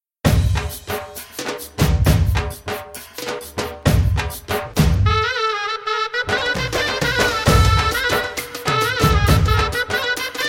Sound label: Holi Instrumental